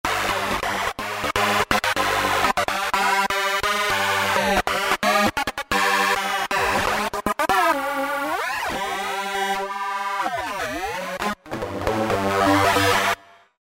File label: Roland V-Synth; D-Stroyer; Factory Patches